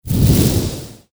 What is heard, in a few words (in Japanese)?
着火